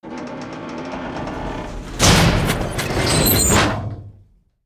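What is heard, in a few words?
Upper Elevator Dock Bottom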